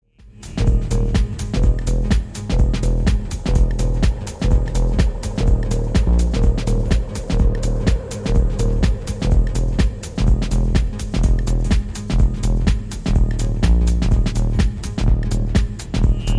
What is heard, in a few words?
organ